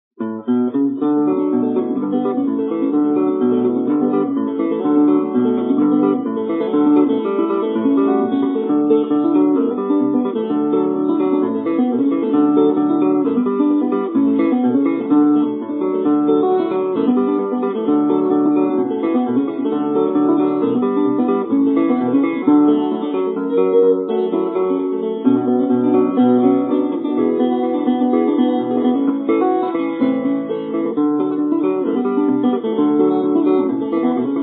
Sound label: Guitar